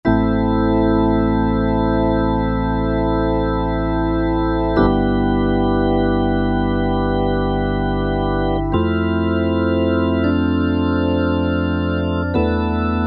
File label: Vektor Organ